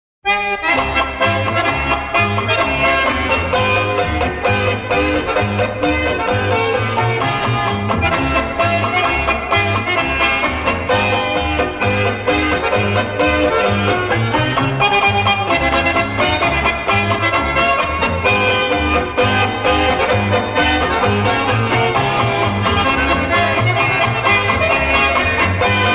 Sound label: Red Raven Polka, accordions